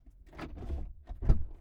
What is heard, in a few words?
Aston Martin Rapide S Door Open Interior; MKH8040; Pole Position